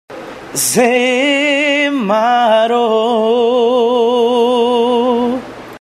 vinheta cantada; voice brasil

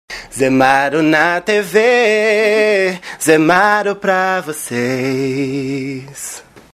vinheta cantada

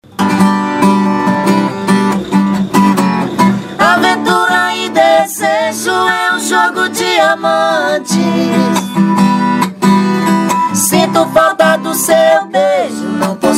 Vinheta Cantada

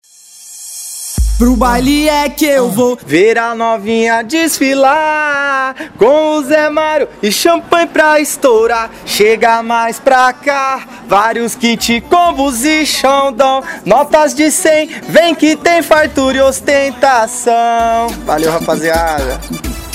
Vinheta Cantada

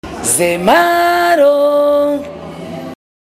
Vinheta curta

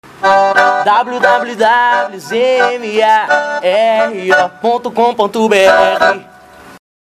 Vinheta cantada

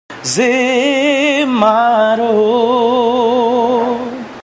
vinheta cantada; voice brasil

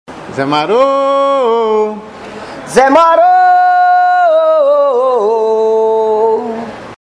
vinheta cantada

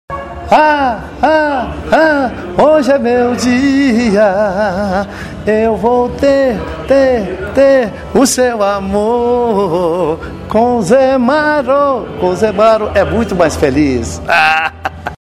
Vinheta Cantada

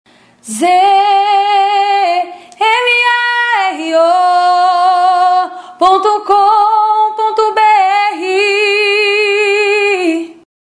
vinheta cantada

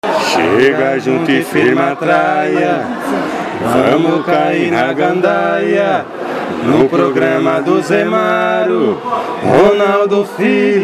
vinhta cantada

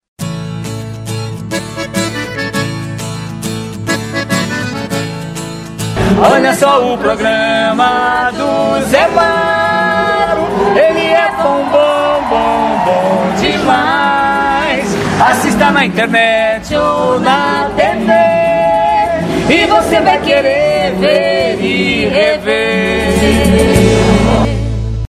Vinheta Cantada

